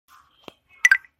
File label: Xiaomi Lock Screen Sound; Phone screen lock